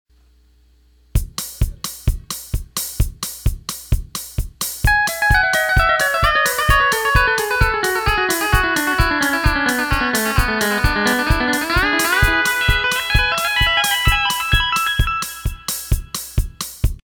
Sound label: Fast Echo Blocked Tab